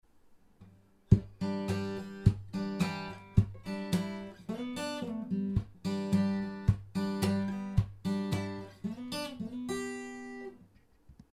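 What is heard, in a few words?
test acoustic guitar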